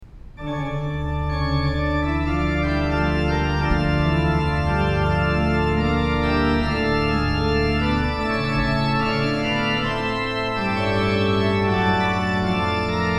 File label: Organ